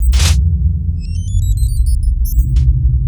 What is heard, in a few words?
Ambient FX, Ambience FX